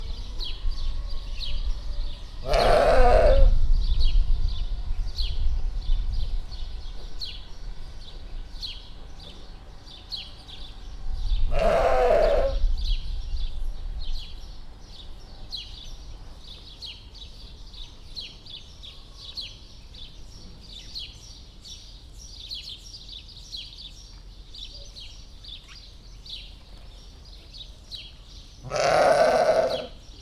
egymagában, wallisi feketeorrú juh; debreceni zoo 2019

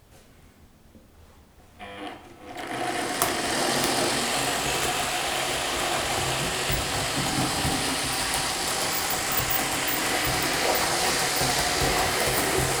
egyszer megáll, később kisiklik, modellvasút h0; perkupa

